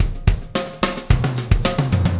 Beat, Loops

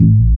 BAS; Best Service Gigapack 2

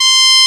FMA OBOE; AKAI S6000 CD-ROM - Volume 1; BrassWind; WIND&HORN

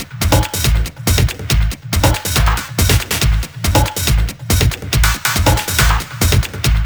Loop 140 BPM; Vengeance; Essential Clubsounds Vol.3

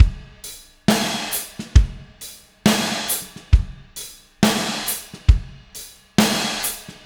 Drums, Indie Rock Anthems; Sonic Foundry (Sony Creative Software); Session 04 - Dubwyzz

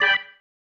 Stab One Shots, Organ

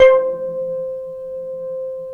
SIMPLE C5; Q Up Arts; Dream Experience

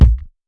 TUBEY KICK; Basement drums vol 2

